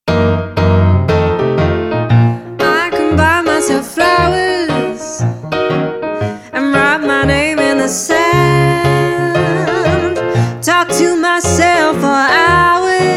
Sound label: Jazz Cover